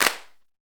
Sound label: PRC XCLAPS, Hand Claps; Club-50 - Foundations Roland; Snaps